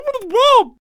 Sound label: Potionseller Attack Vocals